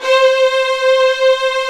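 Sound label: strings, Vlns 8 fff slo; Roland L-CD702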